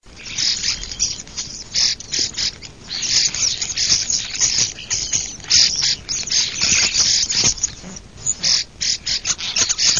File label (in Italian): STORNI